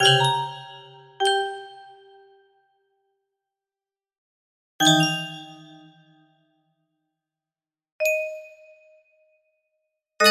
music box, music box melody

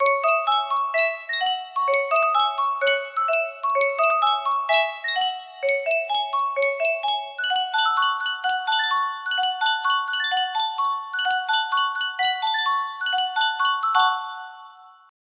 Yunsheng music box, melody

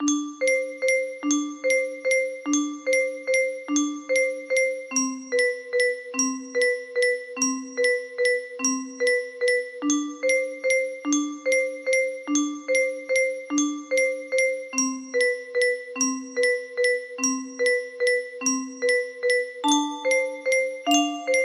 melody

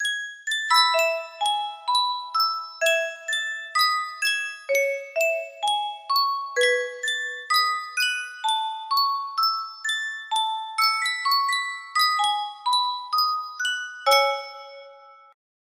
sankyo music box

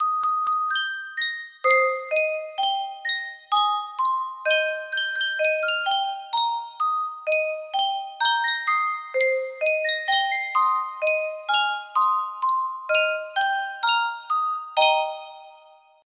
Yunsheng music box, music box melody

